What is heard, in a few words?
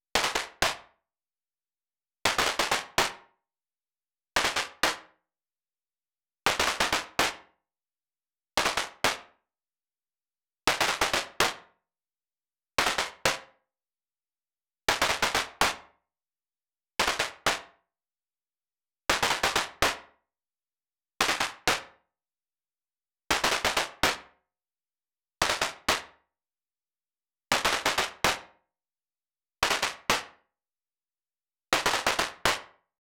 114 Fife N drum Snare